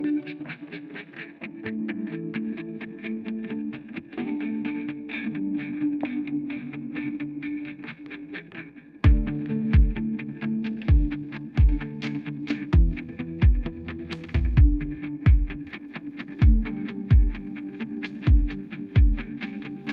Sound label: ringtone pop